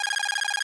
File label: countup Loop